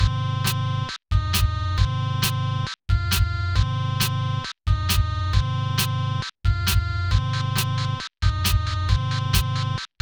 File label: Sine wave